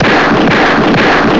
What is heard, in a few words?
gunfire; Pilot Mvmt Execution Awall Gunshot; Titanfall2; Goofy ahh sounds